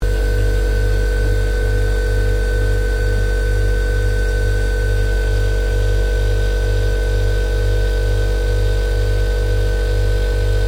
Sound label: FREEZER INTERIOR LOOP, Kitchen refigerator; Kitchen (Sound Effects:Household)